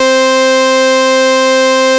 MC 202, BASSES

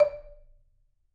D4, Marimba; Sampleheads; Dave Samuels Marimba & Vibes

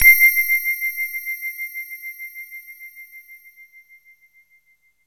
JV bell, JV-1080; Syntec; Wall of Sounds VOL-2; BASIC-JV1080

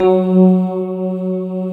SYN K-2000, Roland

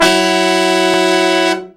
BRA SECT; BRA SECTIONS

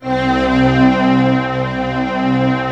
STR, YAMAHA, Analog

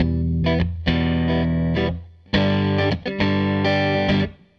Gretsch Guitar Loop

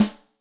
Maple, Yamaha DTXpress-II snare

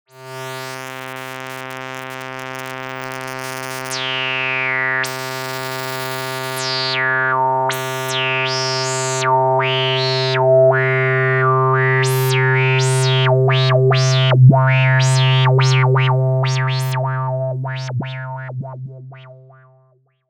pad C3, ARP Odyssey; Polyend; Simulacrum Sounds; 1 Shots; Synths; Pads

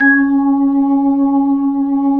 B-3 C#5, Jazz; Keyboards of The 60's and 70's

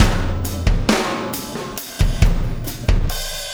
Impact Crater Beat, Processed Drumkits

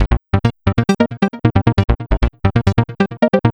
135 Cm, Melodic Loops; M8; Electronisounds

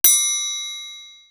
Perc; AMG; Perc One Shots

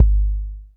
BD, Bassdrums, Roland TD-20

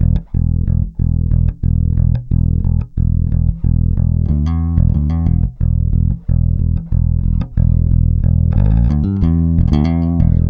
Rock, Bass; Bass X

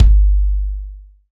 BD, BD REAL

